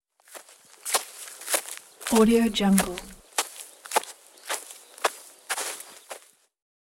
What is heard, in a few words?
steps in the forest